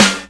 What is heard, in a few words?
clean steel snare drum sample g key; snare drum samples